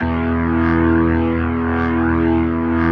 E1, B3

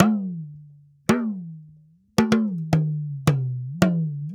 Talking Drum, African

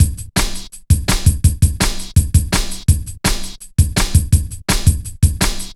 Drum Loops, Jungle; Zero-G Jungle Warfare 1, 2 & 3; Cha 166.5

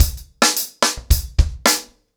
110BPM, ACOUSTIC DRUMS; The Roots Drumloops; Border Case

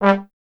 Trombone G 1; Miroslav Vitous; Solo Instruments

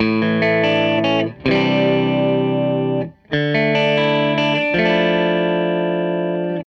Les Guitar Loop

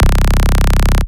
Basses Root C; Vengeance - Essential Clubsounds Vol.4; Synth Shots and Basses; Note C

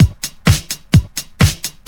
Straight Loop 128 BPM, House

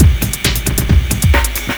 Processed Beat, Drums & Percussion

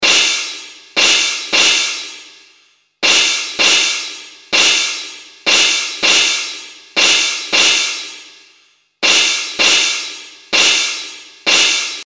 Lo-Fi Crash, Cymbals; Industrial

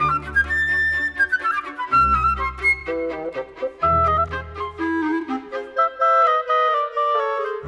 Orchestra; Sonic Foundry (Sony Creative Software); Orchestral VOL-2; Modern 27

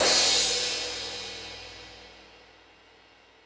More Cymbals, E-MU Pro-Cussion

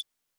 CLAVES, Yamaha SY85 Drums

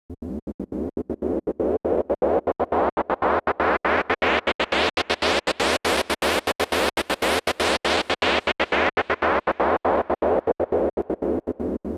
Gated sweep (120BPM); musicradar

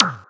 Percussions; Vengeance - Essential Clubsounds Vol.4

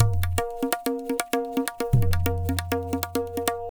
tabla, 130 bpm; Zero-G; Pure Tabla

